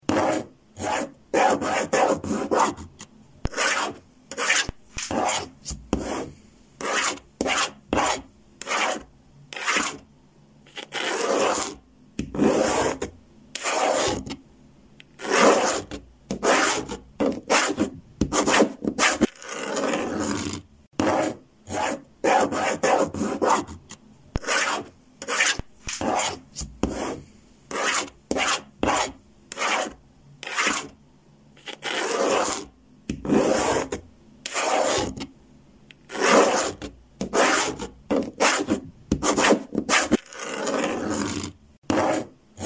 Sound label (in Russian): shk mel po doske